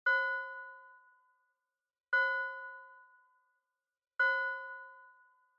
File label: Fire Truck Siren Fake Reverb Bell